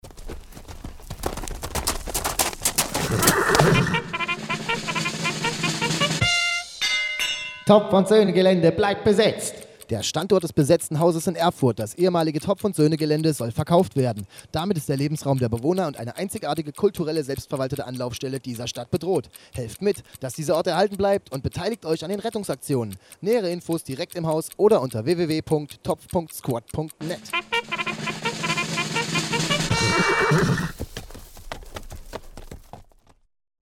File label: jingle